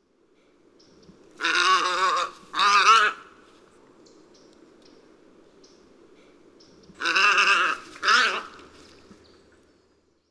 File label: Egretta tricolor; Chordata